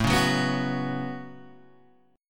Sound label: A 6th Add 9th A down Guitar Open G